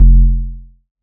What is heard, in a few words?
electronic kick distorted; SoundsCrate